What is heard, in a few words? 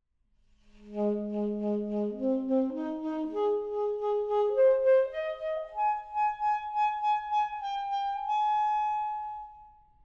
portato, saxophone; saxophone orchestration